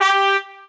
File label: Trumpets G3 mono; Latin